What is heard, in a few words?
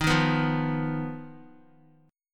Dsharp Suspended 2nd Sharp 5th, Guitar, D#sus2#5 chord; strummed; JGuitar